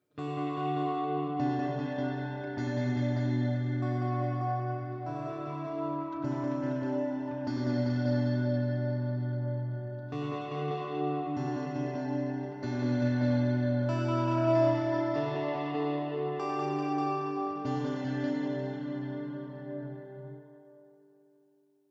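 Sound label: strymon magneto 4 delay heads tripled heads spring old tape crinkle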